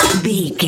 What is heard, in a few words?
metal lid movement impact